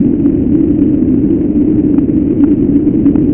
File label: jet